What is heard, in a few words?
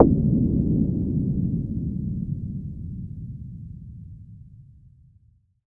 NOISE PERC C2